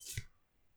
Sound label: card draw